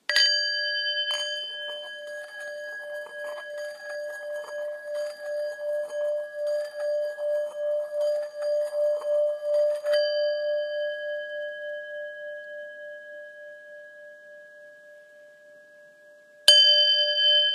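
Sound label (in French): Bol bengale 3-400 sol dièse, Bol chantant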